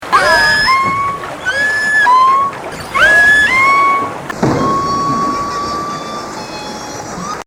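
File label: Transient, whales; Killer Whales (Orcinus Orca) are distinctively marked black-and-white dolphins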